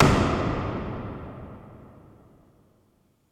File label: Hit Reverb Natural